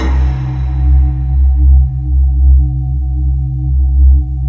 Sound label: bowww C2